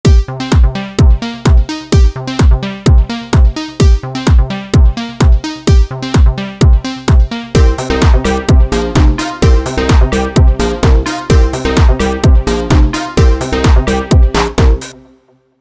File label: clubbing